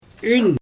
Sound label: singing voice tune